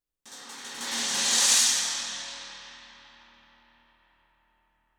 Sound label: CHINA BUILD UP